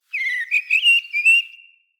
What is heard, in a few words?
Forest Open Glade Bird Close; Day